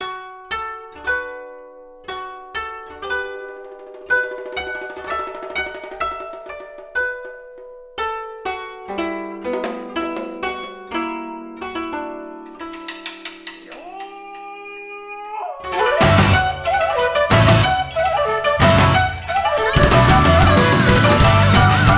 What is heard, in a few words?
japanese modern